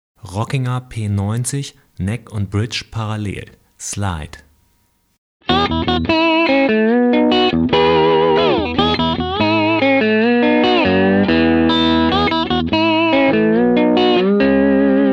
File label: Slide; Rockinger P90, Neck/Bridge, SLIDE; Alles über Pickups